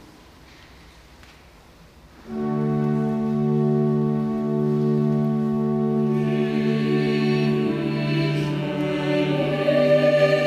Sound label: Cherubic Hymn Ukrainian